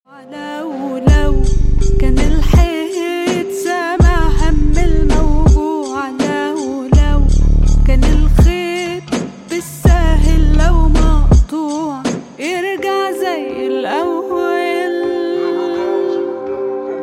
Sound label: ISLAMIC MUSIC RINGTONE